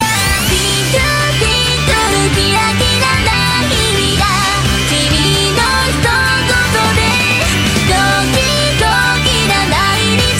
Twinkle

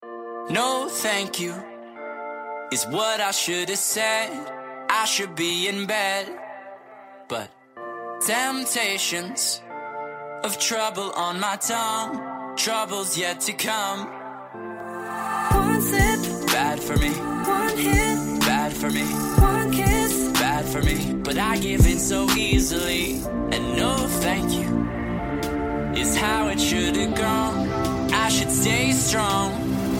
trap

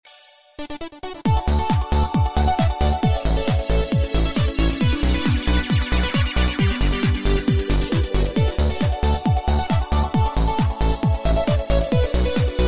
Beat Mixer

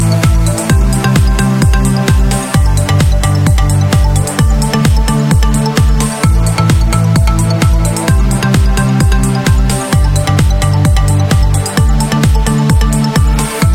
Xtreem Techno

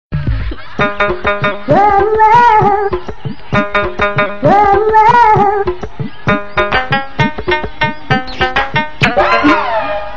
Taper Tone

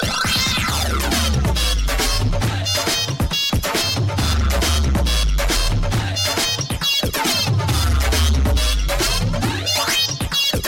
Nokia Dhol Dj Remix

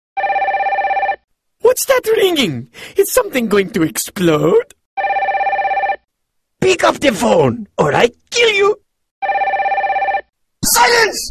Phone; Achmed

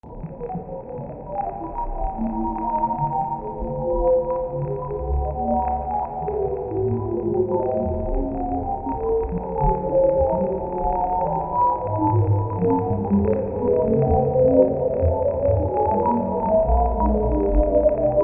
Deep Space

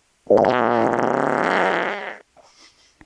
THE WORST FART EVER

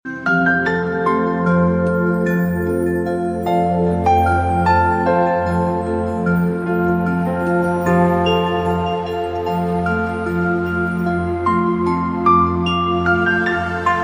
Pure Wake Up Bells

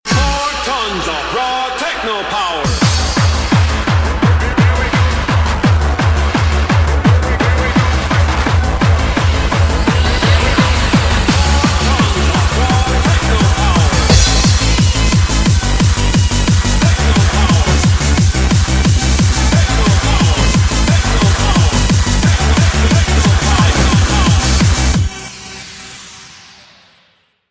Techno Power

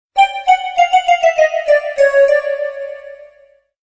Circus Sms

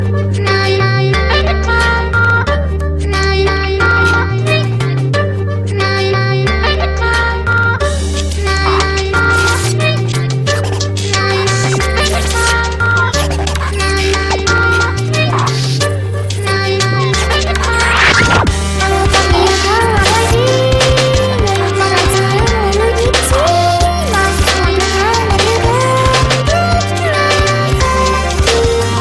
Lots Om Cars

